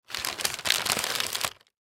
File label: Paper Bag Close, Paper Bag Close Crinkle Lunch Packaging Brown; Foley; SoundEffects-Blastwave